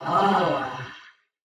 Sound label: Sad Dry